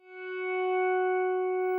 nyckelharpa F#1; SoundBytes - Early Patches

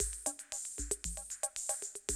Classic Drum Loops

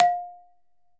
BALAFON F; E-MU Producer Series Vol. 7 – Old World Instruments

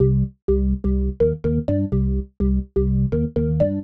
Organ chops (125BPM), retro house

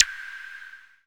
Korg Z1

